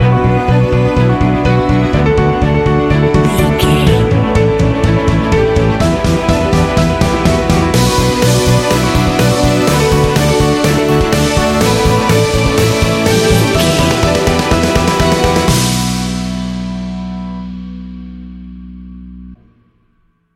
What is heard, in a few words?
inspirational cinematic beauty and elegance sting